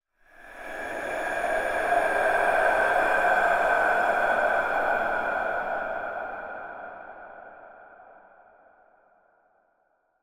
horror breath reverb; sound effects one

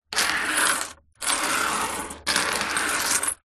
coins several slide on wooden surface; foley

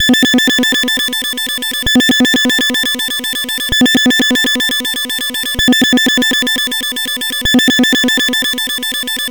science fiction satelite or sonar pips locator

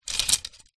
tent pole movement; foley

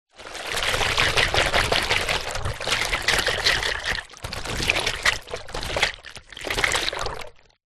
hot water bottle rubber full water shake hard; household

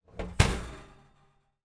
household oven door close

